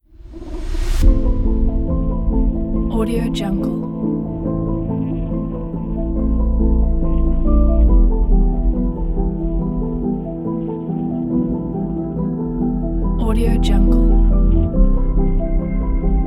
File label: space rocket